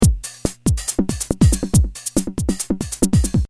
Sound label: Original Drum